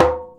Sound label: djembe; Univers Sons; Basicussions